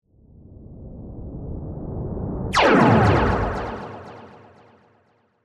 Laser Whoosh To Hit; Science, Fiction; Lasers; BlastWave

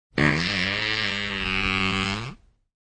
Long Fart; Farting sound; Fart (Sound Effects:Human)

